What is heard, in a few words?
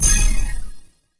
combo